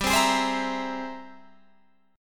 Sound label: Ab Augmented Major 9th G, guitar